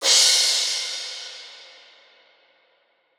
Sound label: Swell Crash; House; Drum One Shots; Cymbals; Cymatics